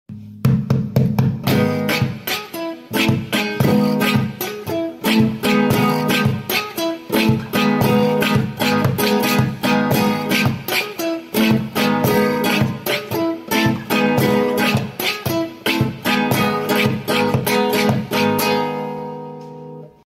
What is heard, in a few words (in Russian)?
na gitare